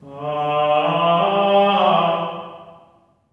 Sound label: GR ALLELU, Symphony of Voices; Spectrasonics